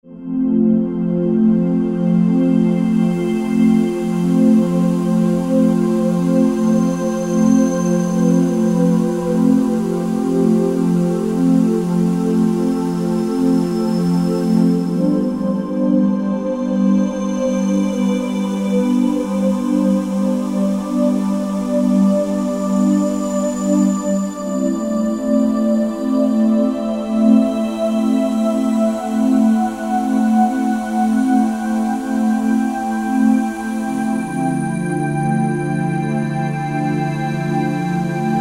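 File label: Pad Glass Choir; Yamaha EX5R; Factory presets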